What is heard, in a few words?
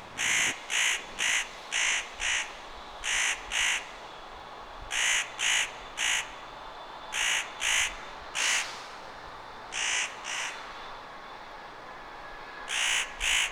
Spotted Nutcracker Nucifraga caryocatactes macrorhynchos, spotted nutcracker call